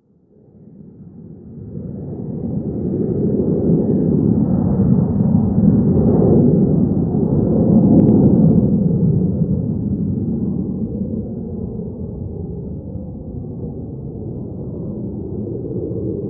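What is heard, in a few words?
jet flyover interior; ModernWarfare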